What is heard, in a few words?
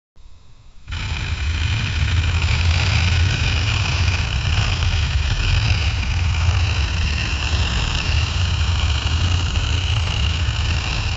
sci-fi gravity sound